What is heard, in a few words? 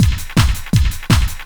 LOOP, Eurotech; Sounds Good